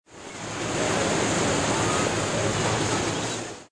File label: Beach, Nature Backgrounds